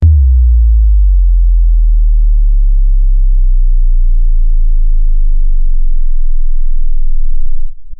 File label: deep boom from a bass heavy speaker